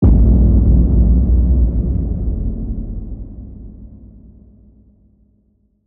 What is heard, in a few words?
Black Hole Evil Boom